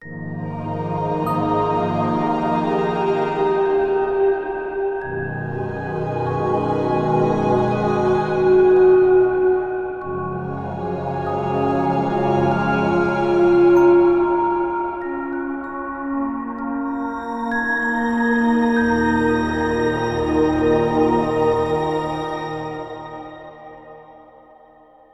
Peaceful Stinger; rise of nightmares xbox 360 gamerip 2011

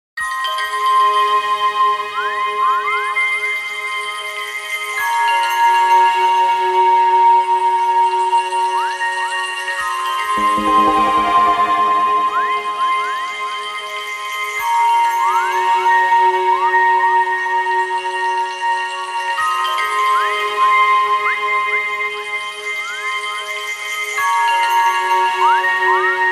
Standby; culdcept revolt original soundtrack dynamix 2017

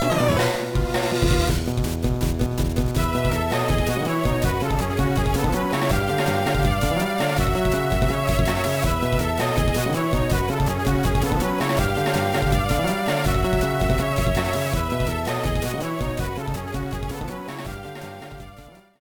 Tense Competition!; Pokémon LeafGreen; GBA

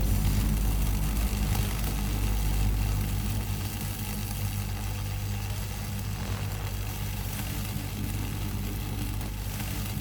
Magnetic Ring Loop; Drifting Lands

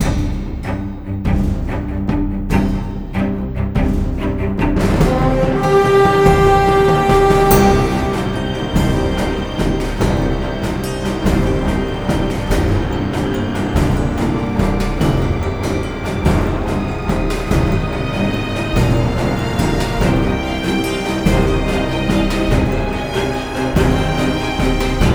Combat Theme Looped

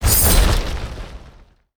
Boss Attack

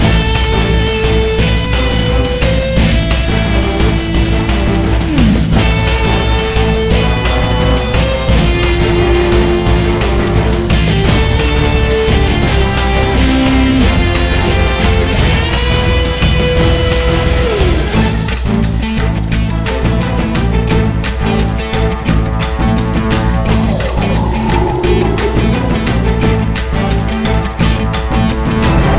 Track Menu; Emergency Disaster Rescue Squad; NDS

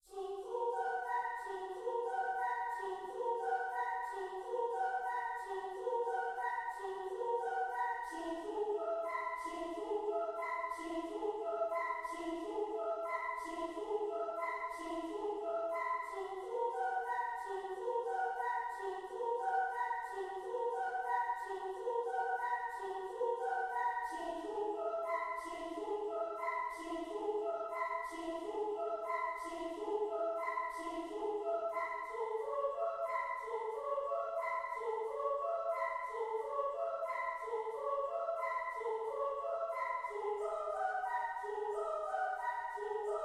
Space 2 choir

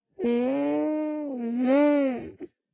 Reaction mmmm

